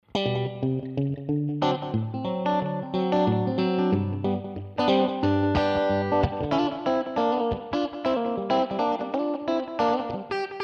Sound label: guitar; HARDBARD FX